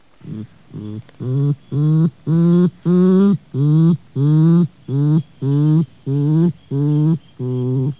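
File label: porcupine; zoo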